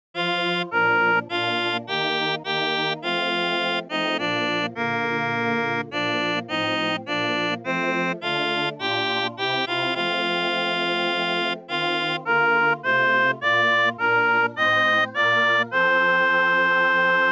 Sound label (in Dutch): sopraan